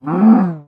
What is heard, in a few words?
hurt, cow; ayuncraft-1.5